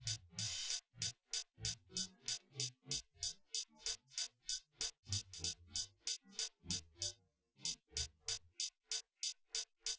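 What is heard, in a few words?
with drums inst sim; SASSEC07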